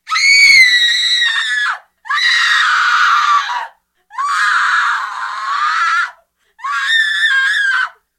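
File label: female scream; Project Zomboid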